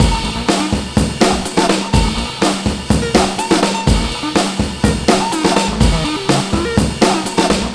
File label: DRM 124, Breakbeat